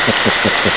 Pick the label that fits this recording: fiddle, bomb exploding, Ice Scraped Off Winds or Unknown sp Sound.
Unknown sp Sound